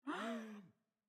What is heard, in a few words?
audience gasp